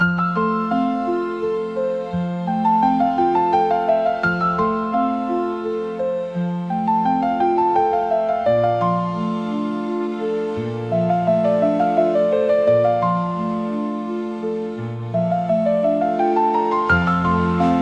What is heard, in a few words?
Piano